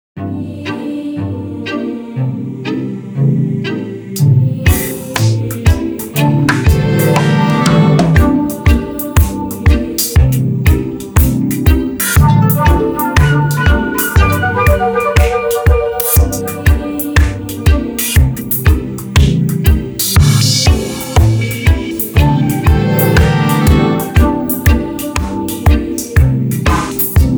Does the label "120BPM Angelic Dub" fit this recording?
Yes